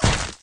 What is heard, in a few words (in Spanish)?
impacto; archery target practice